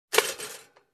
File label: Toast is ready